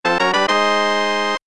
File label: Unlock, ui; NanoDroid